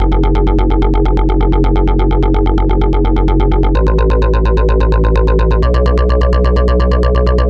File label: Bass Loop F 128